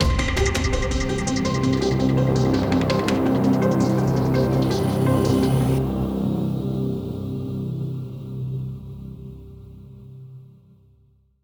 Basic Mood Alteration

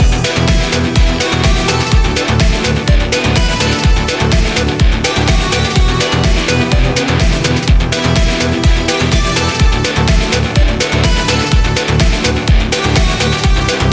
Stage Loop

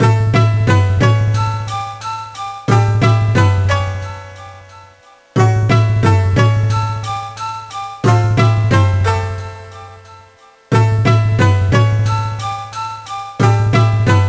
Rhythm Minigame (Karate); ever girl; gba gamerip 2005

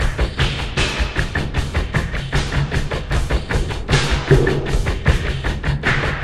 Lake beat

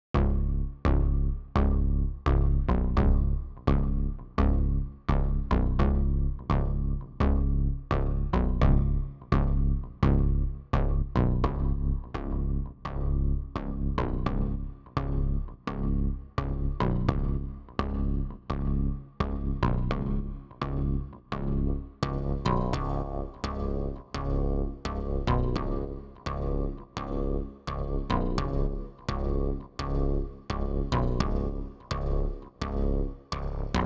Bass; Risk of Rain 2

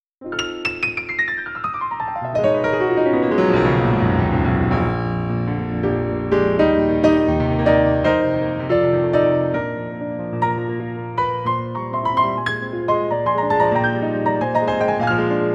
BGM Event Piano; tokimeki memorial girls side 4th heart; Scenario

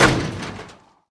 Dunk

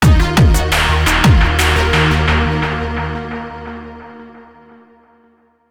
DramaticEvent

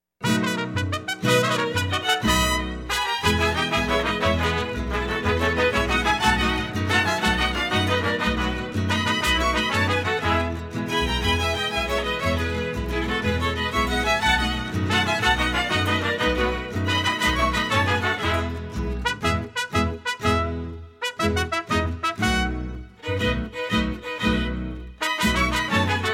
Mexican Hat Dance; Guacamelee! Super Turbo Championship Edition; Alebrije Dead

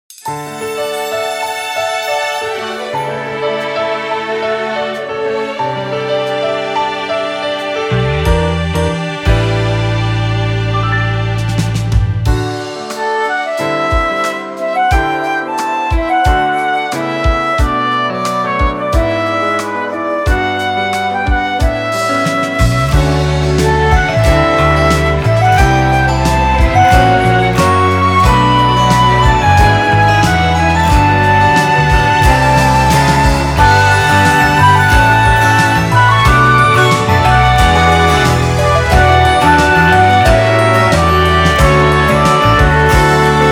Music Event

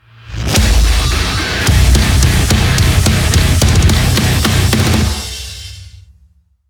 heavy; Doom

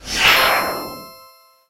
bubble popup start

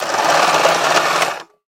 Food Processor Short Burst

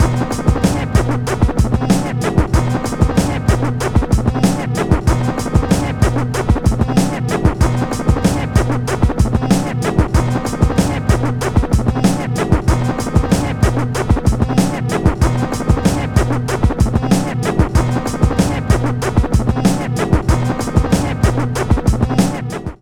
Menu Loop; NBA Street; GC; PS2

